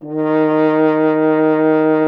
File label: F. Horn; Miroslav Vitous; Solo Instruments VOL-2